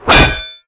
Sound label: blade